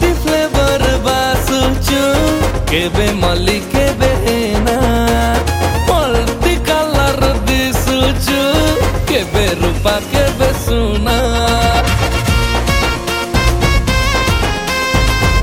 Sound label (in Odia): Odia new song new dance song